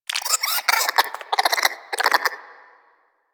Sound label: Player Lure Call; Deimos; Animal Lure; Cryptilex